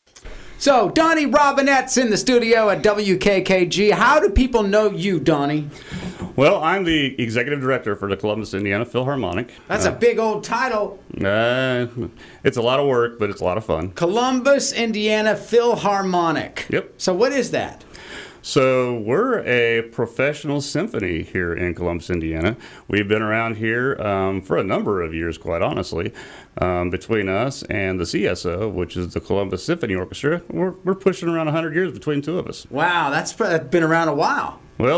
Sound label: donnie harmonics